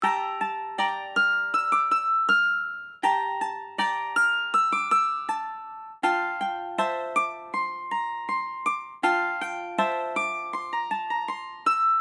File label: Medieval bard mandolin sad melody loop